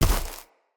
Step Veg, Footsteps; Enemy; Corrupted Sproutfolk

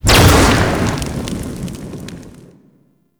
Flamethrower Fire 2D; Weapons

